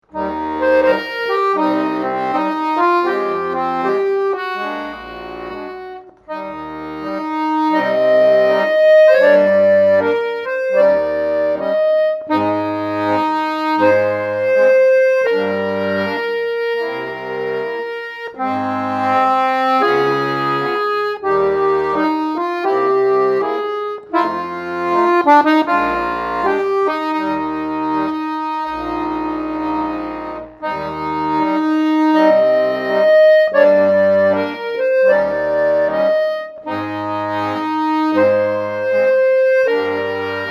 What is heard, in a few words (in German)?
Akkordeon Arrangement